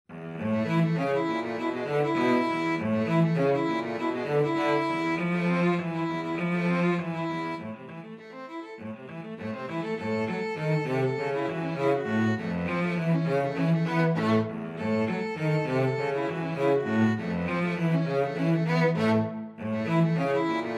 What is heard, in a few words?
violin cello duet